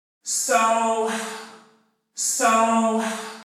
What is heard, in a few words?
Vox; Unit Rcrds